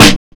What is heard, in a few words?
Snare, Drum and Bass